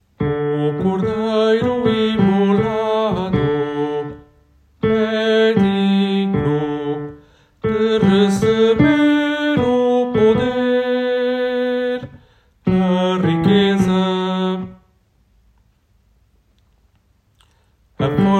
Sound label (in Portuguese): Entrada refrao baixo